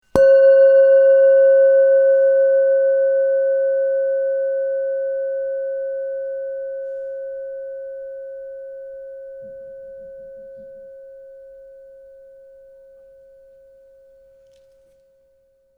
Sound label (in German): klangschale erdenjahr 337g